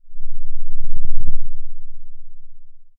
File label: Sound of a lion’s roar